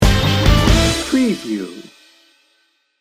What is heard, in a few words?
struggle and triumph sting bumper